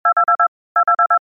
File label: TV